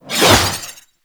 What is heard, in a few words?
Sword Whoosh; DE; Warframe; Weapons; Sentient; Stalker Sentient Sword